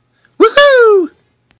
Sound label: woohoo short